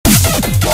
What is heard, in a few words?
Dubstep